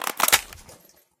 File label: kacpdw clip in; Escape from Tarkov